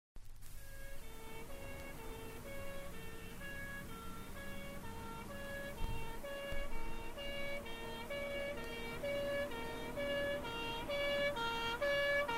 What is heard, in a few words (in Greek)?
Mesa sygoinwnias Nosokomeiako